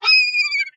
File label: Seagulls chirp and cry, close up. Gull Beach, Seagull